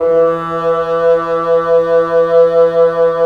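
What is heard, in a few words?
WND BN, wind bassoon; LA Composer vol. 3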